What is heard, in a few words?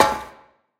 metal step; Golden Crayon Sounds